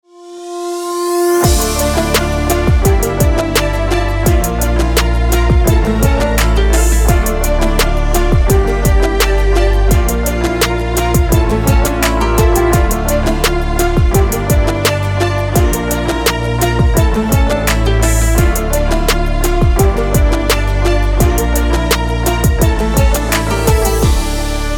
background music for short dramatic video vlogs epic promo